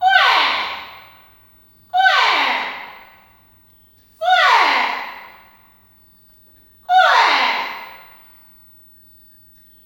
BIRDCALL, Heart of Africa; Spectrasonics; BIRDMEN

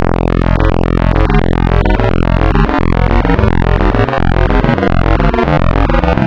Spectral, wavetables; Architecture 32

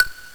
BOTTLE, Hip Hop Misc Perc; E-MU Producer Series Vol. 8 – Platinum Phatt

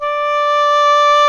SOLO WINDS

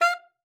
F5, alto sax; Xsample VOL-2

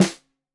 Snare 65 Reg Stereo, Deeply Sampled Drums

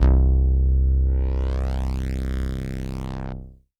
Synth Prophet Bass C; Electronisounds

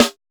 PICKLE-O SN; POP-ROCK2

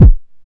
BD ANALOG; Sound & Vision - Gigapack II CD 2

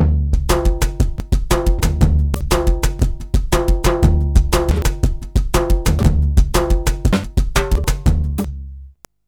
Modern Percussion Loops, MPC60 119; Soundscan vol.56; AKAI

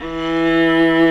Vla E2; Digital Sound Factory Virtuoso

